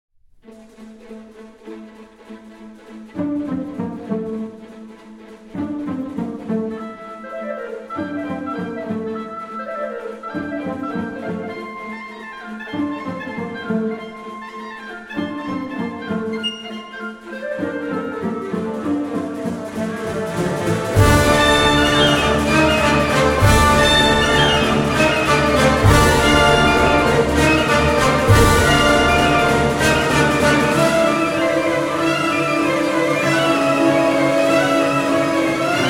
Polovtsian Dances Presto - Allegro Con Spirito; Borodin Prince Igor, Act II; (Instrumental Version

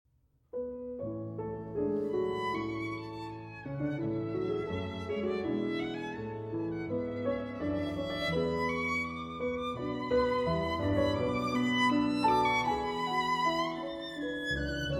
Sonata For Violin and Piano in A major; Franck; 4. Allegretto poco mosso

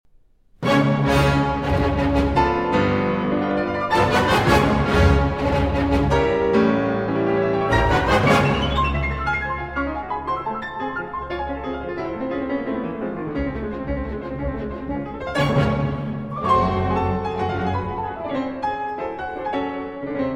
Piano Concerto in F-Sharp Minor; Rachmaninoff; Piano Concerto No. 1; Op. 1 (1917 Final Version)

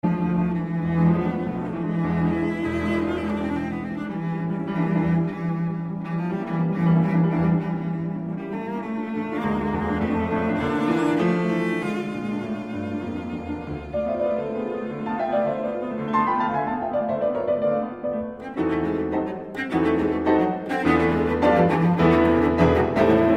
Cello Sonata in A Minor, Allegro Agitato

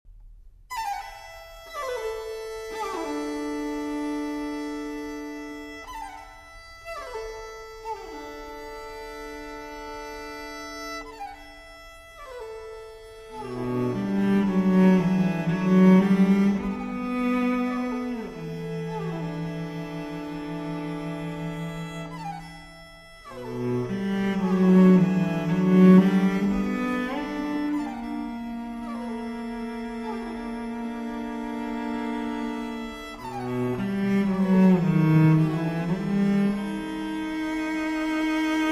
String Quartet; No. 1 Atomizations II. Entanglement

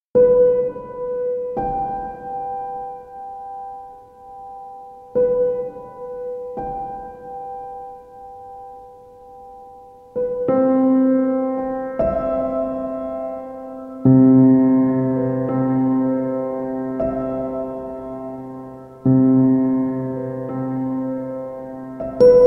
Piano Sounds

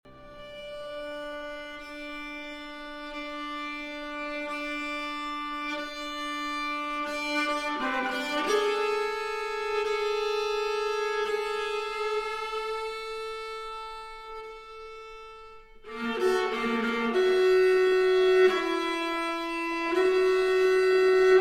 Cadenza Viola

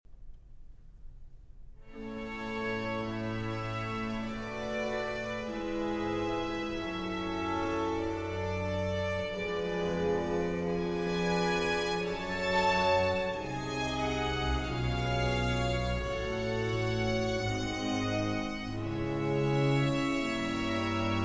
Concerto For Violin and Strings in G Minor

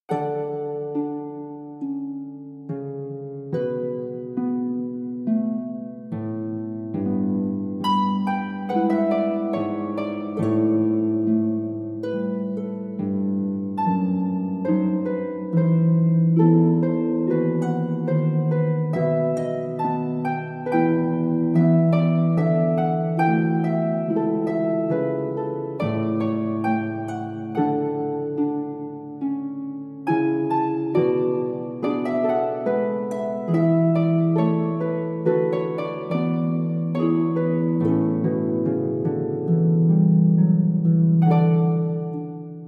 Air on the G String (Harp)

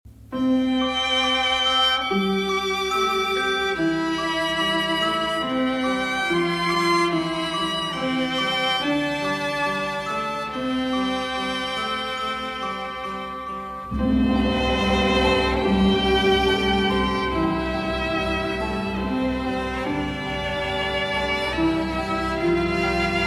Chorale Andante Moderato

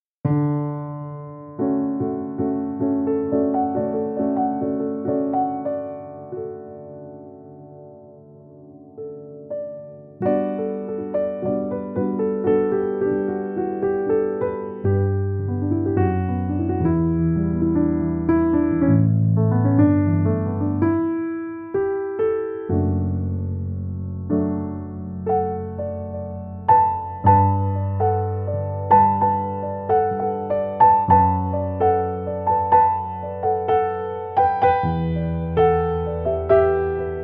Jazz Instrumental Chill

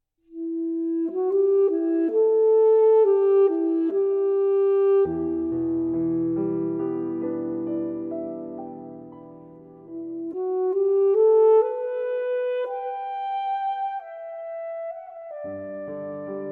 Studies in English Folk-Song Adagio

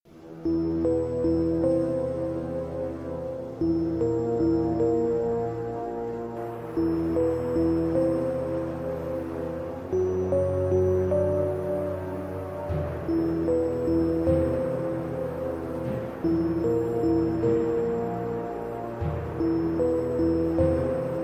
Ambient Space Music

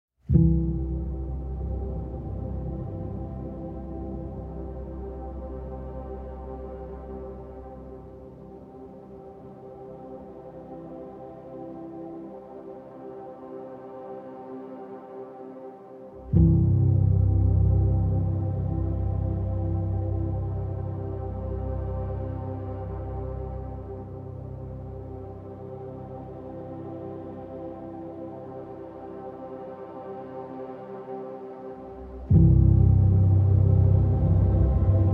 Instrumental

